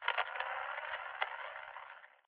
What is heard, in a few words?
static; Titanfall 2